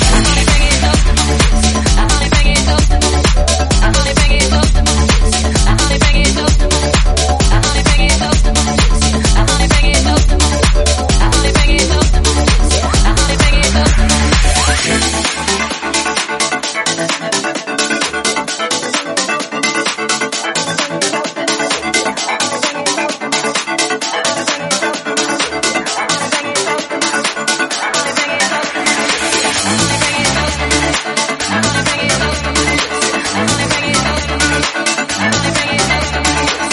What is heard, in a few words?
130bpm D